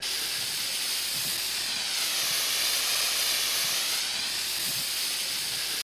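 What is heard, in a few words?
Air Gun Small Hiss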